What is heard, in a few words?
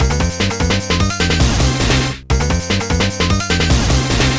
beatbox; Pinball Dreams